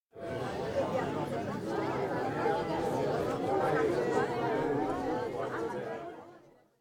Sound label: crowd chatter homefront; anemoi